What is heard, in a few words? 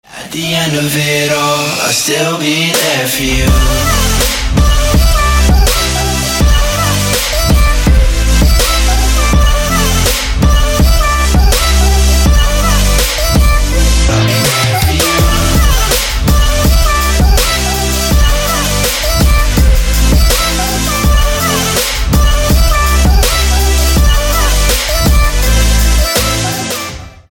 future bass remix